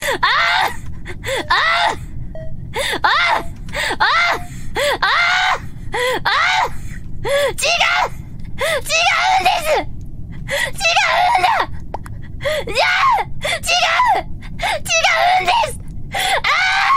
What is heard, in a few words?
polka sus noises